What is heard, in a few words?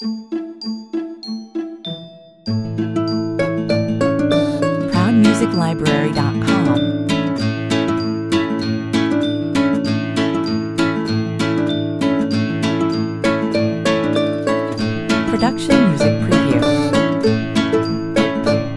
bouncy, 100 bpm